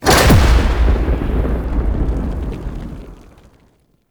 Interior Orbital Station Pod Eject; Warframe; Tau

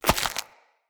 Agaric Mushroom Grow; Resource Materials

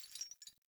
Grunt Foley Movement Chains; Sinecure; Melee Grunt

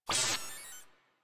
Proto Infested Mutalist Pain, Vocals; Warframe

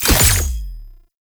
Vendor Conquest Modifier Select; Entrati

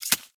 Micro Step Snow; Warframe; Corpus; Arachnoid Micro

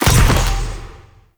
Ground Spike Burst; Enemies; Abilities; Warframe; Zariman; Void Angel

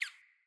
Bird Single Chirp; Paragon Bird Guide

